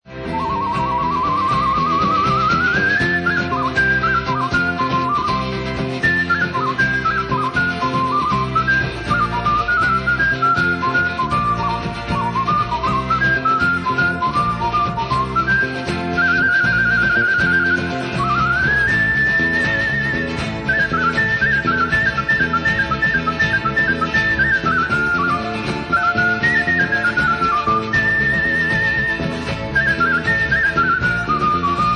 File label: Whistler; Mexican; AMIGA POWER